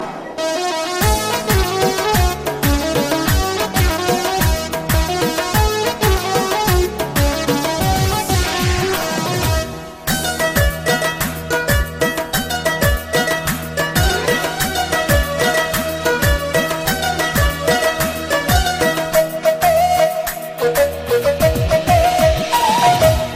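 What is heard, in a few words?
Pashto Instrumental; Pushto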